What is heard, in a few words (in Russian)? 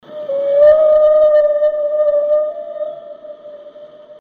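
кит, звуки животных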